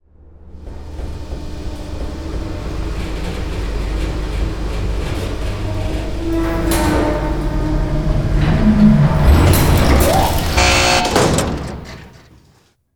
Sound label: elevator intro seq